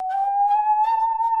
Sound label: saw danger, music, zombat